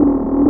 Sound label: Carving Laser; Weapons; Thalassophobia Restored